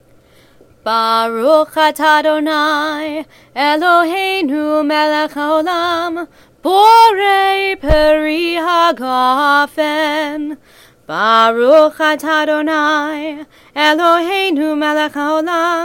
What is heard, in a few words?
Kiddush